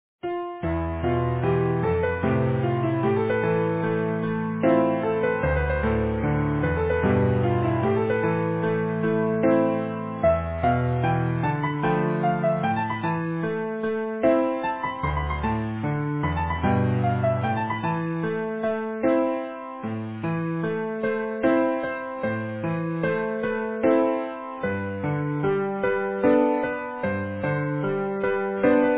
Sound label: Easy Piano